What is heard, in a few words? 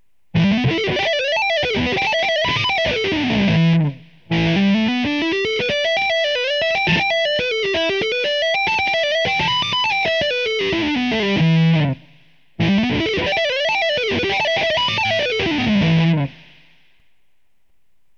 Lick, guitar